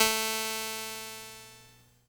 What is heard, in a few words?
VOX A2, Vintage Keyboards; Big Fish Audio - First Call Vintage Keyboards